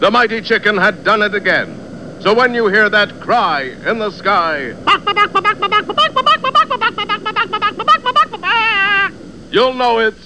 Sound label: cry sky